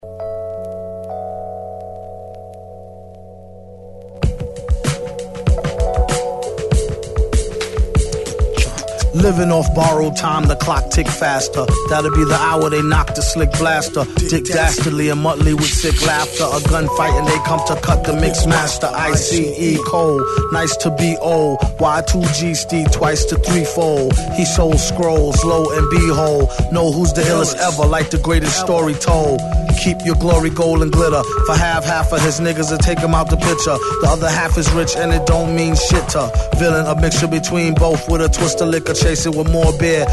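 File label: hip hop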